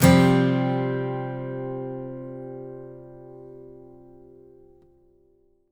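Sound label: ACGTCSUSD -L, Country, acoustic guitar sus; Whole Lotta Country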